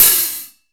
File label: MIX LHH, OLD HH; AKAI S-Series CD-ROM Sound Library VOL-3